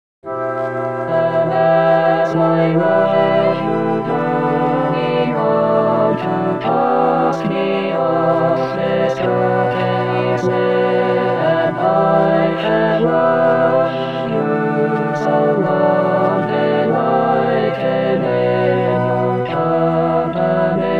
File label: tutti; greensleeves; rimailleurs